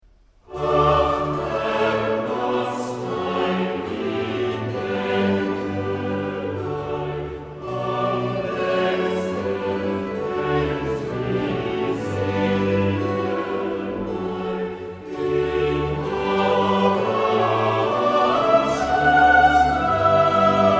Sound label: Chorus; Ach Herr laß Dein lieb Engelein